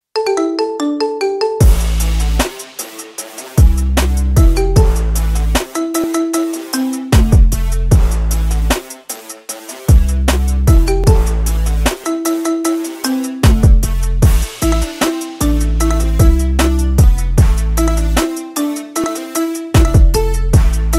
Ringtone Marimba

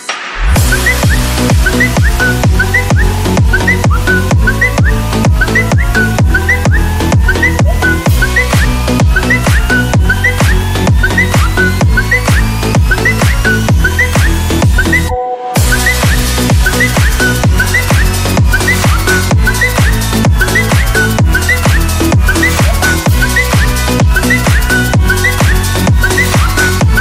WhatsApp Notification Theme Remix

